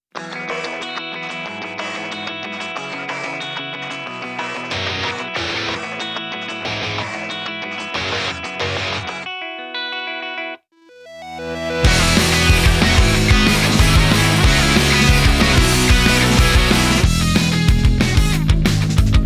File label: Instrumental; Gintama